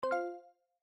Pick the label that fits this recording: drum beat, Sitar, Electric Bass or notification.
notification